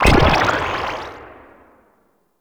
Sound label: Metamorph Augment Burst; Warframe; Anima Animus